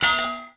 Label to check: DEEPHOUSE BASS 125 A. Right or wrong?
Wrong